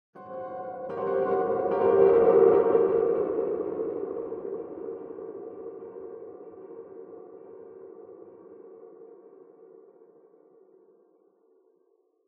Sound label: Piano Disaster